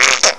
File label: squish; bitsy